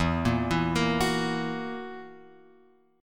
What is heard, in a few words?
E Suspended 2nd Flat 5th E, chord; Esus2b5 Chord {0 1 2 3 X 2} arpeggiated